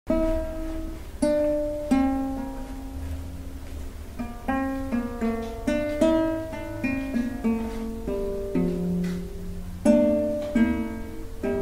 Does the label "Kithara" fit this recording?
Yes